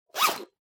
Pencil Case Close

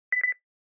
Cell Phone Hardware Beep

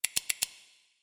Scissors

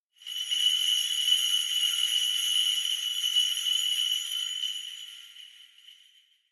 Kagura Suzu

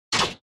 Spotlight

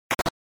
SNES RPG Attack Arrow

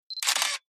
Camera Film

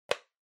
Music Box Foley Close

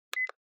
Cell Phone Hardware Button Beep

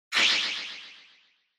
Motion Pop

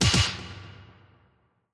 Hit

